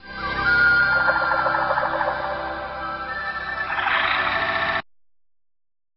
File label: flying plane